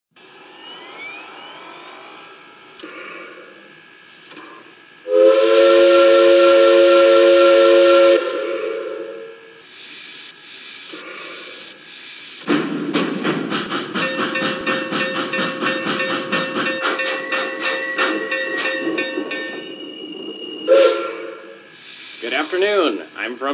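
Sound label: 442 Atlantic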